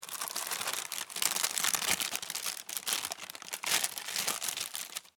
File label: Food Cereal Bag Open; Household; SFX Bible